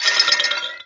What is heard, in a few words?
Powerup - Layers Sfx